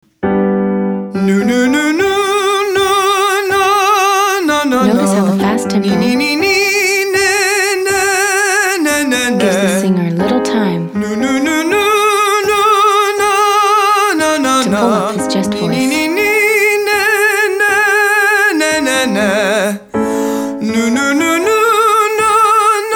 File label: Exercise 8 newa no nee nay males; Singing